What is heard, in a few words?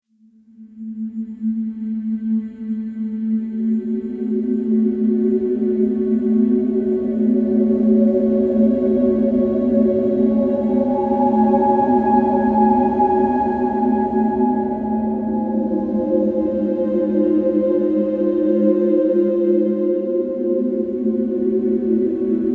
FLOATING DRONES